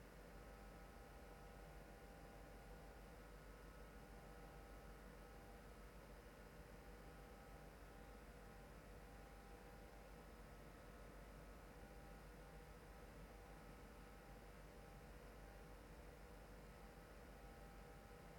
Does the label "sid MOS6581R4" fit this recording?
No